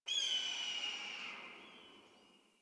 Exterior Distant Calls Happy Calm, Ambience; Warframe; Duviri

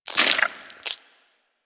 Armadillo Tongue Move; Warframe; Hunting Critters; Foley

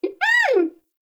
Baby Vocal; Nokko; Warframe; Nokko Colony; Find Nokkos

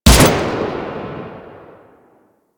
Emplacement Shot; Grineer

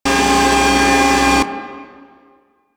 Infested Robot Arm Malfuction Loop; Ambience; Props; Warframe; Corpus Infested

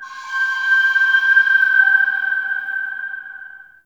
Root Cave Mysterious Whistle E; Ambience; One Offs; Neathuns Root Cave

